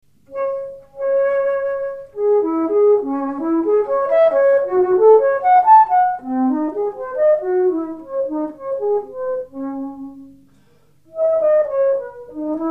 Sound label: flute alto, woodwind; woodwind sound clips